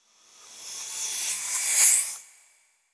Shimmer, Hiss, Retracte, Weave; Electa Quinney

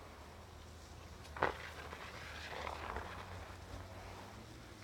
LANDING DISTANT, FLYING EAGLES; EAU CLAIRE; EAU CLAIRE HTS; THOMAS NAUNAS

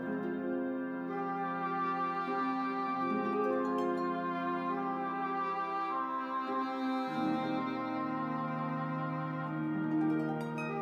Music Only; Electa Quinney

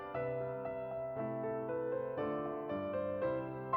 Music Only

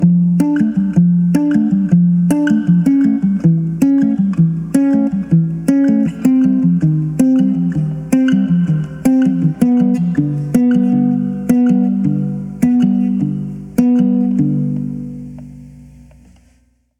Arpeggio Bari; Poetry Project

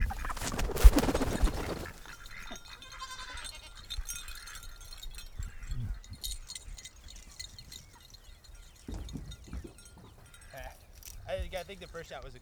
GOAT SOUNDS

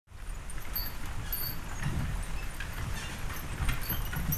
Horse Drawn Wagon By; Electa Quinney

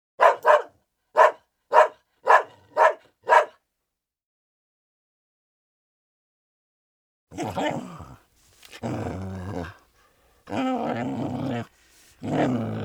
Dog, Mixed Breed Medium Dog, Barking

